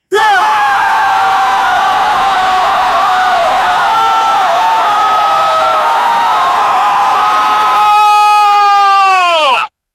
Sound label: battle cries; battle-cry